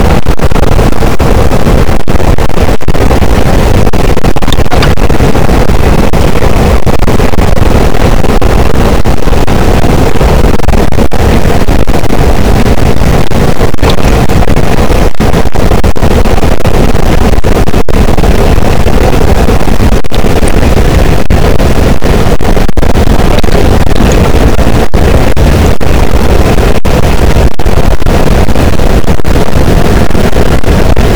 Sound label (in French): cachalot positif, boat, Physeter Macrocephalus